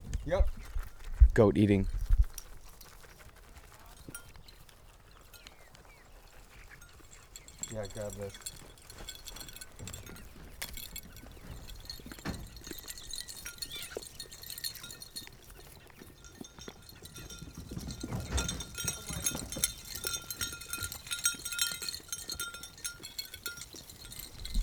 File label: GOATS